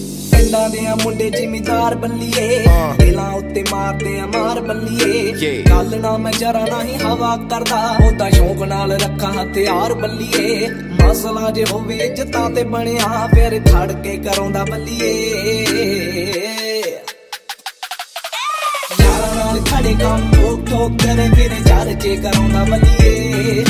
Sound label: punjabi ringtone